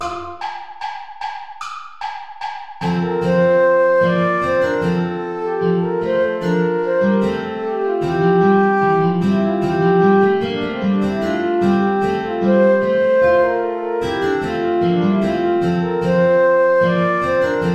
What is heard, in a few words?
play along without guitar; praetorius michael; ballet des baccanales